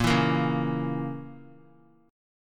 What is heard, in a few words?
A sharp Diminished Guitar, chord; strummed; JGuitar